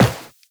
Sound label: Run Metal, Footsteps; Warframe; Tenno